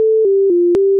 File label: melody